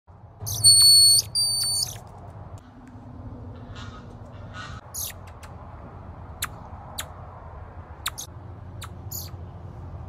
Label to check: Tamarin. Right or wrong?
Right